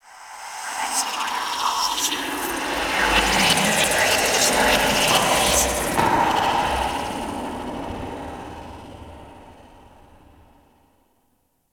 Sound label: Corrupted Artifact Random; Ambience; Props; Warframe; Orokin Derelict